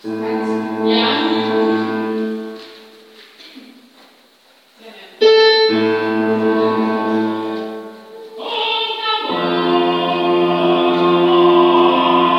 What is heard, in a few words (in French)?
un tambour alti avec piano